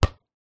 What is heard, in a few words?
sport soccer ball bounce hard ground